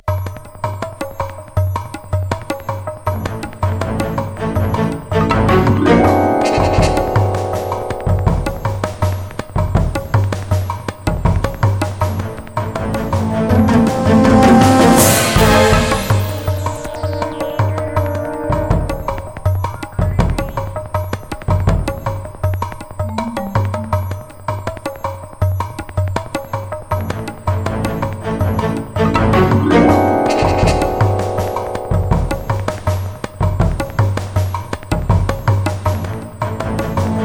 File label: Music Game Boss